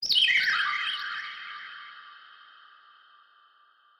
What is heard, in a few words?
ghost